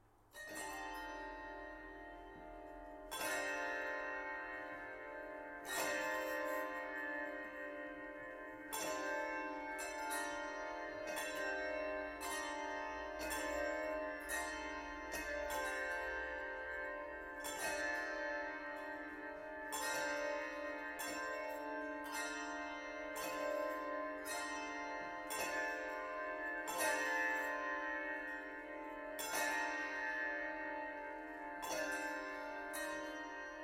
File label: bells